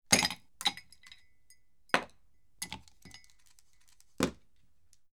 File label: Object into Glass Inserting and Removing; Foley; Foley Essentials; Foley - Misc